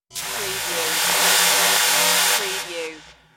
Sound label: Electric Static